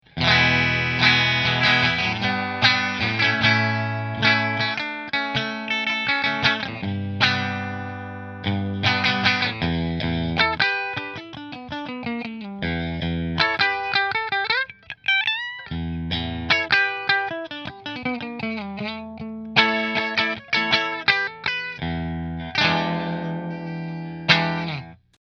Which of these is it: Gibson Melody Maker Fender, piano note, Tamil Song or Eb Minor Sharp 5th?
Gibson Melody Maker Fender